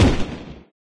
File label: tank fire bullet